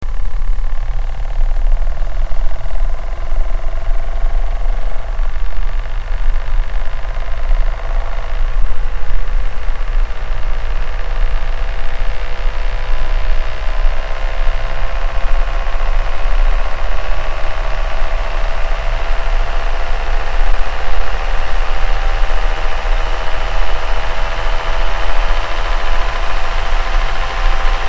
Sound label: bearing, fan; vaio